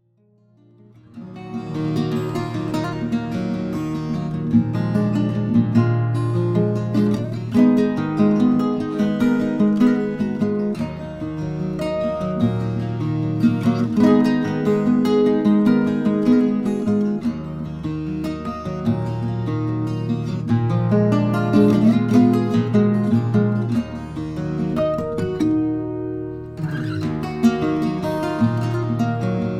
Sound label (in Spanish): Guitarra